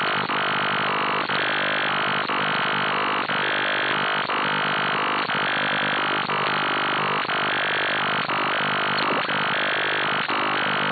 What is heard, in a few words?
3673kHz AM RTL-SDR Dipol 80m, QRN